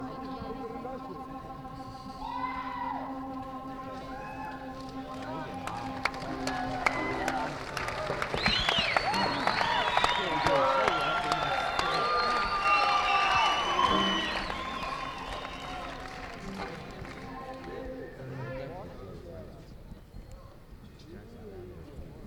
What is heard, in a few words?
Tuning